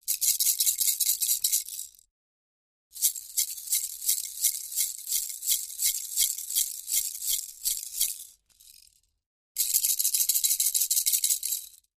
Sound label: Toy Rattle X3, rattles